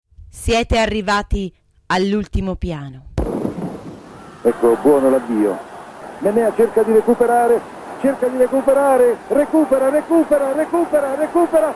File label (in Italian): applausi